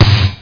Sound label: shoot; CD Action